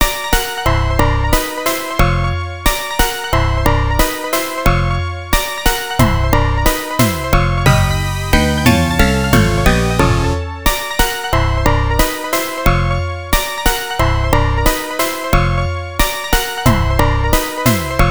Enemy's Victory; Nectaris; X68000

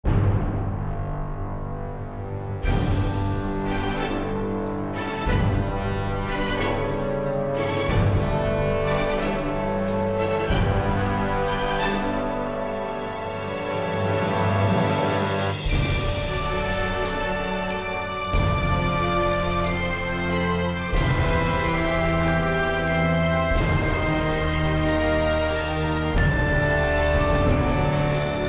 Victory; littlebigplanet 2

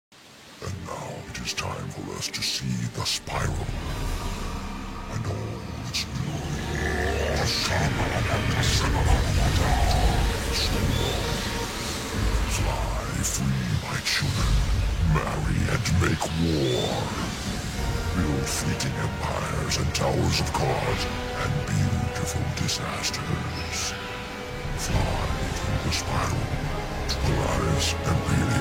Ending Theme, with voices; Khrysalis Old Cob

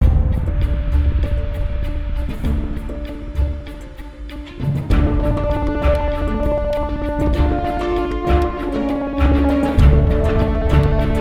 Amb Loop; Mx Us East Vip A

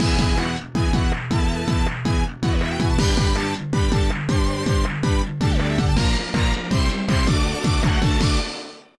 Win; Kamen Rider Battle Ganbaride Card Battle Taisen; nds

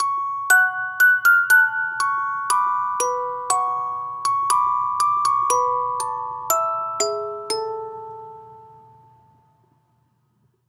Music Box; Assassin's Creed Syndicate

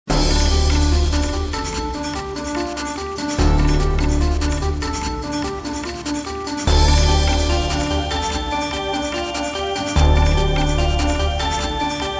Waves (off Vocal); Kimi no Ashita e

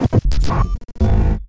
Glitch

